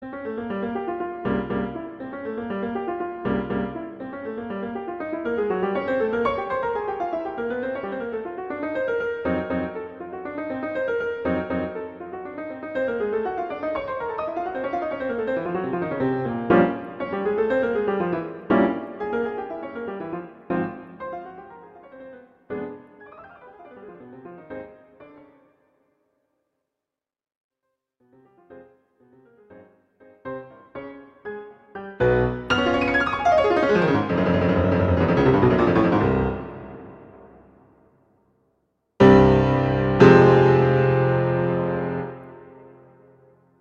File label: F minor, Allegro molto; Chopin; Preludes